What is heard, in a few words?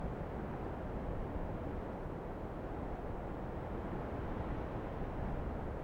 desert light wind; ambient; Counter-Strike Global Offensive